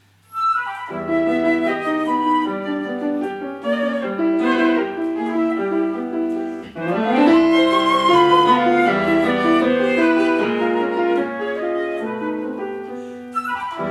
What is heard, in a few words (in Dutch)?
fluitliedje